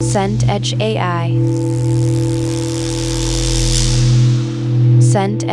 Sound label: forcefield hum